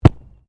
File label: Body movement Organic Impact Heavy; Body movement foley to simulate falls and deaths; Wrestling And Struggling Sound Effects